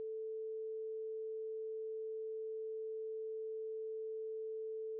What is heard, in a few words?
sine 1khz m40db